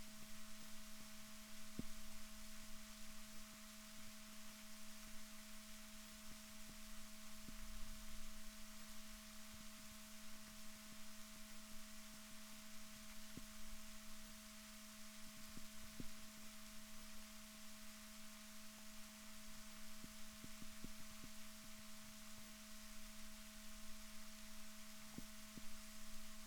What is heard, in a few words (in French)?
rorquals negatif, Balaenoptera Physalus